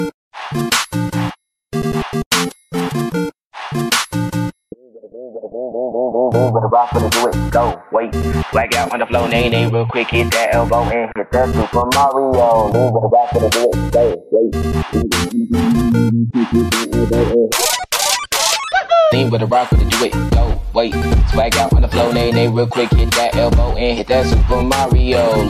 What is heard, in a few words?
super greek hit